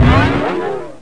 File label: LAUNCH; Total Chaos Nukem 3d Pack; duke